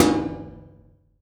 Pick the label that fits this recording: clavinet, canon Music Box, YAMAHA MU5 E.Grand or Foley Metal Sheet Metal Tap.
Foley Metal Sheet Metal Tap